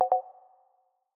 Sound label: beep